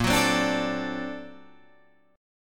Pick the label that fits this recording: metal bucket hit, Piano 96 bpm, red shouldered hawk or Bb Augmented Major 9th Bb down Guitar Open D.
Bb Augmented Major 9th Bb down Guitar Open D